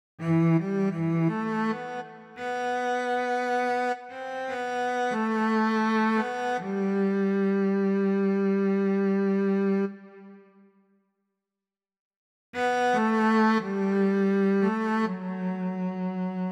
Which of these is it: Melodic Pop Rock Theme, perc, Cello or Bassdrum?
Cello